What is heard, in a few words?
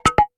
pep, notifications; Nothing Phone (2)